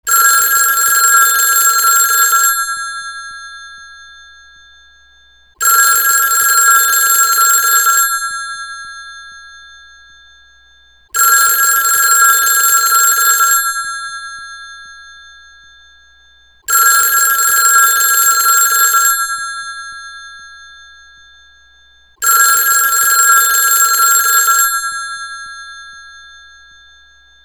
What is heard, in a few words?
ringback longer